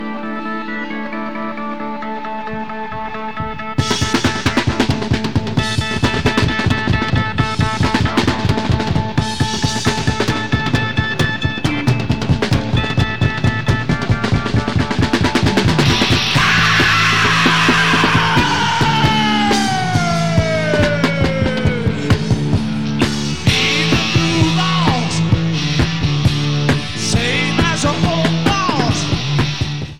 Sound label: Drum Solo, Live; Live In Philadelphia 1973